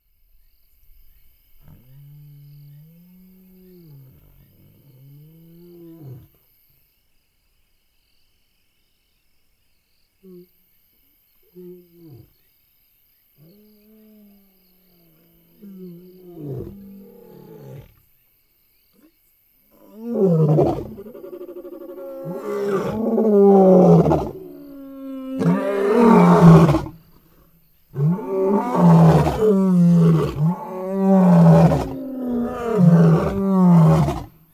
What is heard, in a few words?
Roar